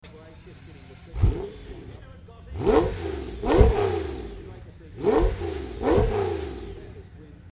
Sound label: pagani zonda